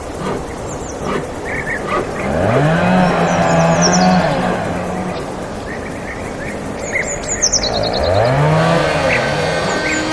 saw amb

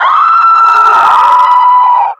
pain

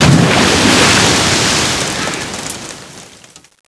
water crashing

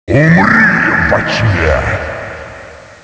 die

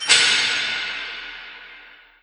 metal, ambience

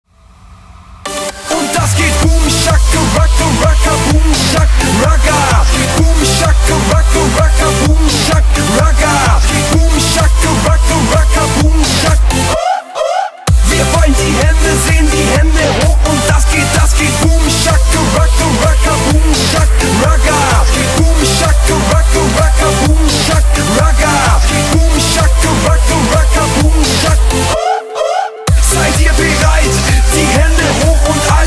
song

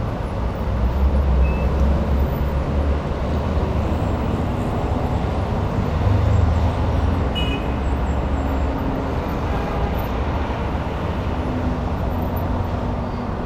city traffic

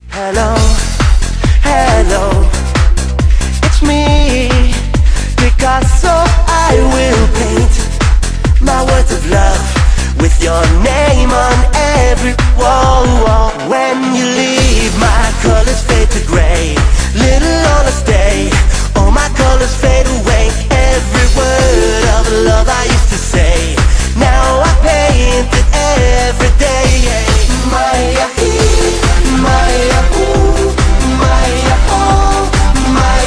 music amb

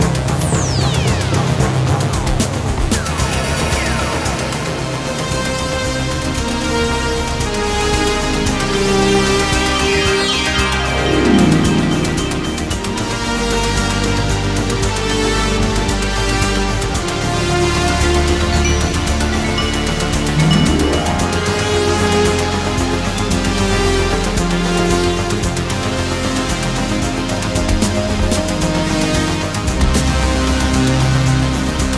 loop

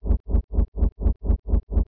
ventilo; kz ins industryrun

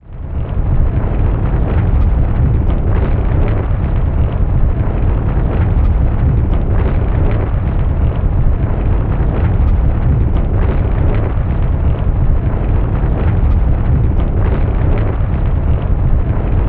quake

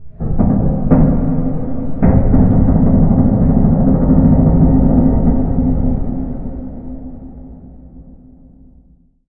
clang, ambience

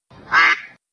Quack; duckslides

